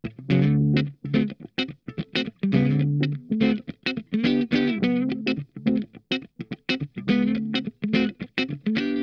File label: STOP START, Funk Guitar; Zero G - Funk Guitar